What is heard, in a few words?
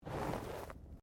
Body movement Slide